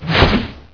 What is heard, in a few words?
hammer slash